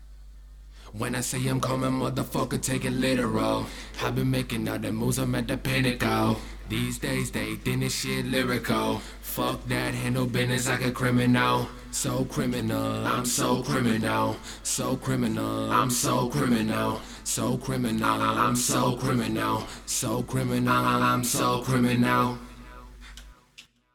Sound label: HH 90 Am